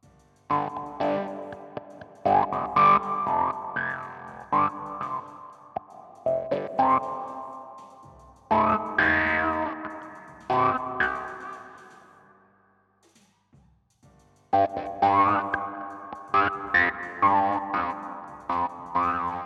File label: guit fuzz L